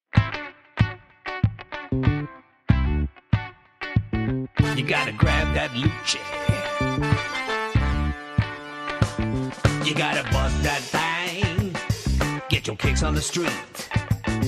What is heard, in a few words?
funk